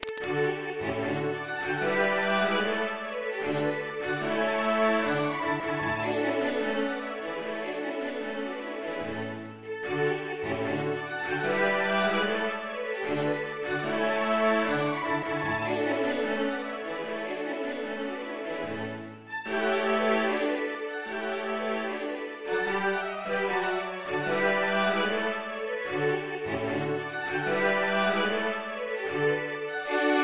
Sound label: Haydn String Quartet in D major; Haydn String Quartet in D major Hob 111